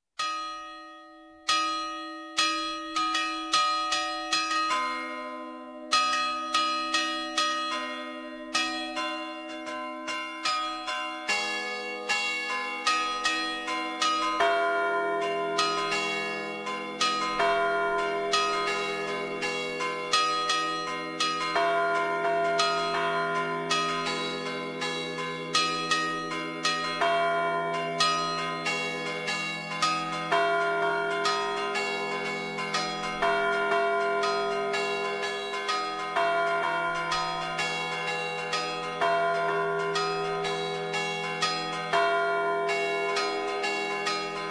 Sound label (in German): glockenspiel; zeneggen